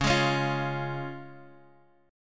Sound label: C sharp Major, Guitar Open G